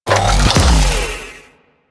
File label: Companion Tesla Globe Cast; Warframe; Pets; Zanuka; Abilities